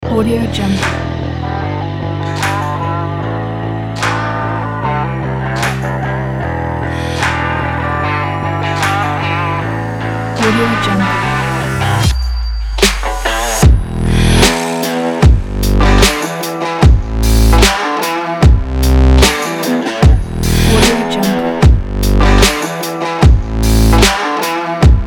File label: fashion guitar slow electro sport